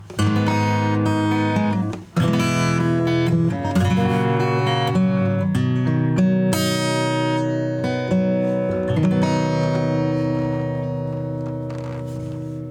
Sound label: acoustic; MC-77